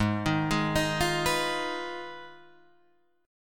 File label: G sharp Minor 6th G sharp down Guitar Open G